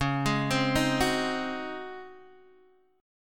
C sharp Major 7th Suspended 2nd Suspended 4th Guitar Open D